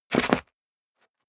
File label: step sneaker concrete grit jump; schritte